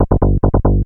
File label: moog; Techno Music Maker; Bass